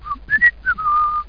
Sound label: whistle; Franklin's Reading World